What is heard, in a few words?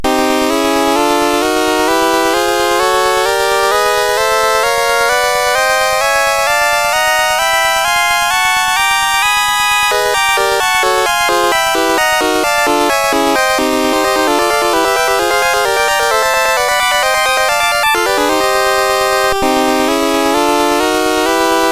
Minor 9, sid MOS6581R4; hvsc